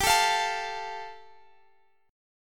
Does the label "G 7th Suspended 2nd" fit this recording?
Yes